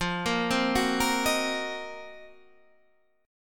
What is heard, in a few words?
C Minor 7th Flat 5th F, chord; Cm7b5/F arpeggiated; JGuitar